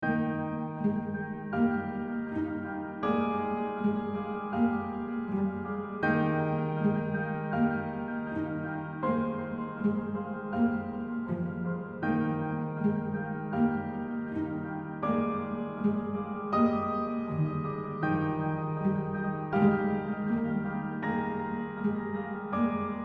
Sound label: ambiance; epsilon indi